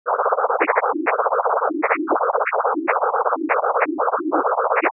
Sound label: Weird Xmas Song; xmas ctf 2019